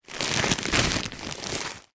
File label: household nappy bag remove from pack